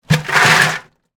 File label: Ice Chest Dump, Ice, In, Bucket, Restaurant; Machinery Sound Effects; BlastWave